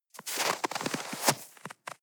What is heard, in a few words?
step snow scuff